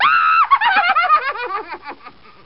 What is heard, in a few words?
cackle, spooky sounds